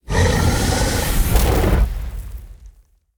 boss earth wind; spiritking

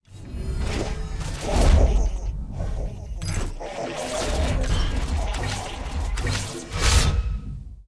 idle, monster

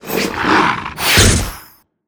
hunter atk; monster

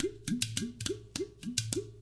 village, jungle

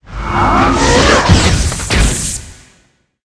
dark archer attack

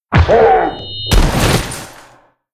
biggolem boss; thedead3

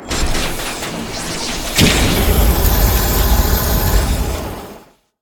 robotboss, monster

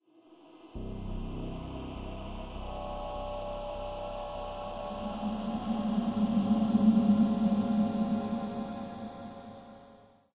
corridor, undeadtower

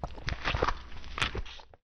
thanatos idle, monster

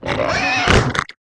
boar attack

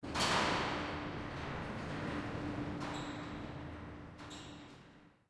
sewer; ruina

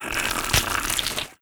cryonics main, monster

